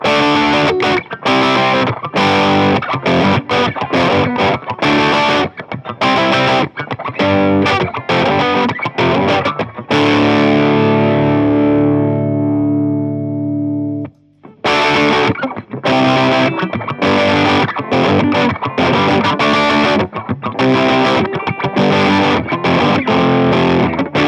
od 335 talla bd2 od3; dsl401

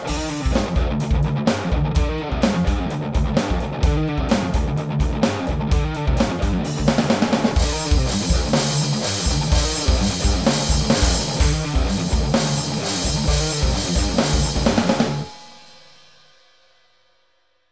superhevi

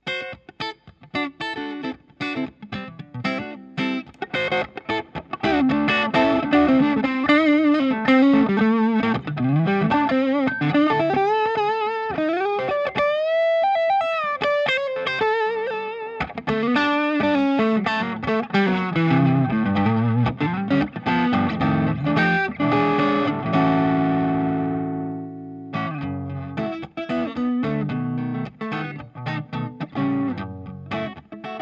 sparkledrive strato kaula

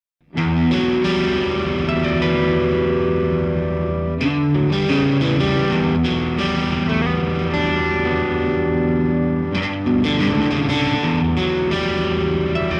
rullaus kitarat

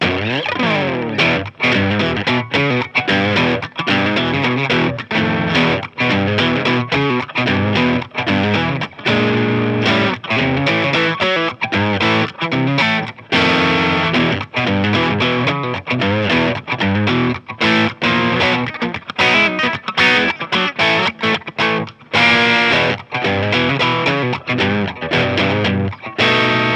clean strato talla sd1 eq

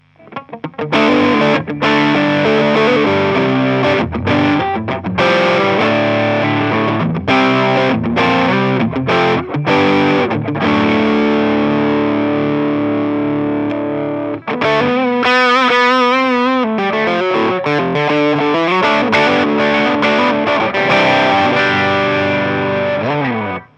clean 335 kaula talla sd1

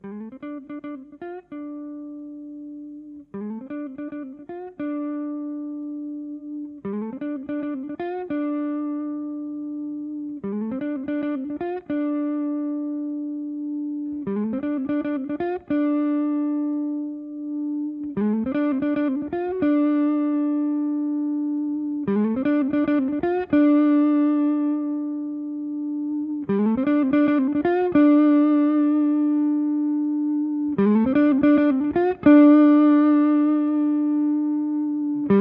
335 kaula test, sparkledrive

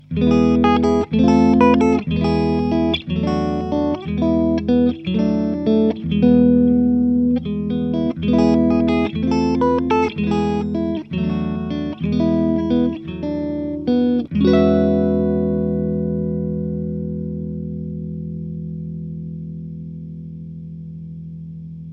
clean strato kaula ja keski; dsl401

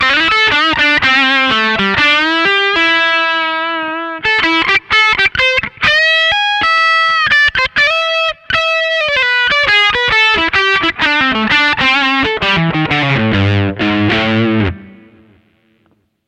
blues strato talla; v-twin

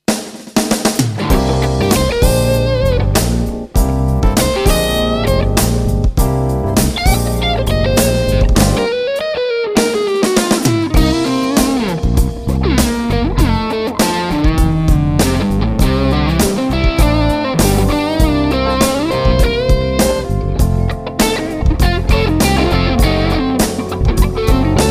sennheiser e606 testi; budda sd30

